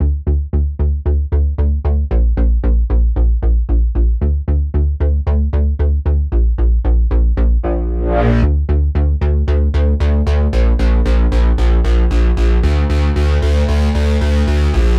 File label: short wave; porphyra hybrid by ocean swift synthesis